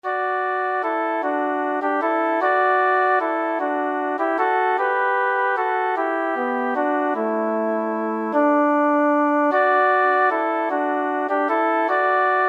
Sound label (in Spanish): 2v; Unidos en el canto